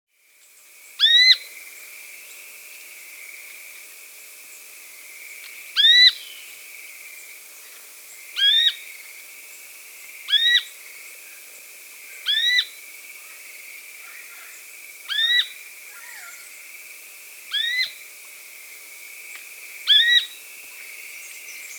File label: Rufous Spinetail (Synallaxis Unirufa), Andean Birds; S. America